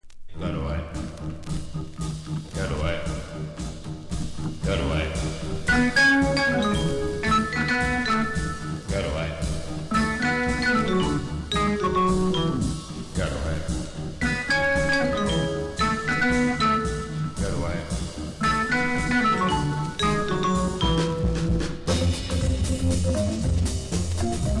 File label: instrumental